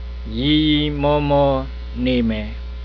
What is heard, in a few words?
laughing; ရယ်ရယ်မောမော နေမယ်။; (We) will be laughing.; Burmese; This is a lesson for reading practice. You will hear the vowel "aw" at the sentence level.